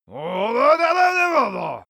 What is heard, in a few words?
male shout angry